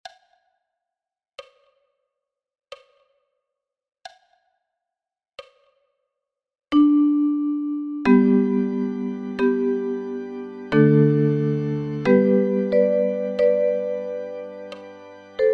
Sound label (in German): TRIO Uke Low G Uke und Bariton Sound